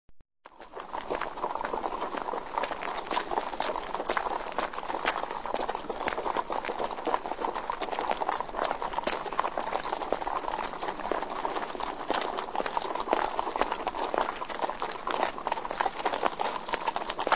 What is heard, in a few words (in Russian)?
loshadi po graviyu